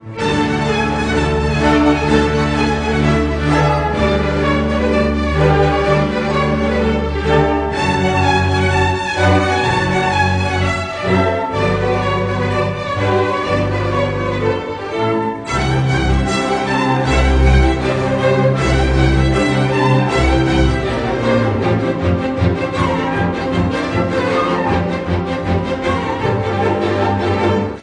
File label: Romanian Folk Dances